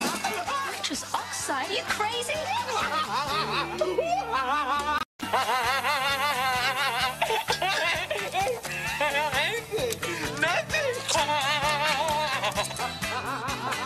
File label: Laugh; Pauly Shore